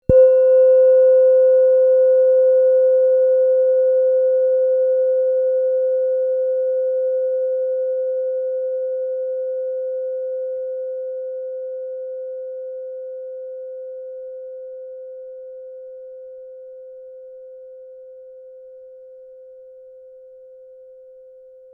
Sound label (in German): klangschale sonne